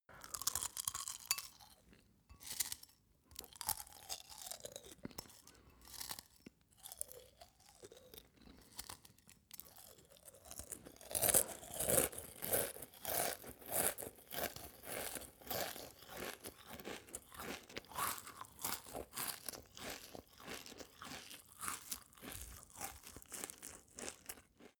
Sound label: male eat cereals full